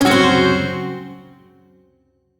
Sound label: Shawzin Hirajoshi Chord; B; Warframe; Duviri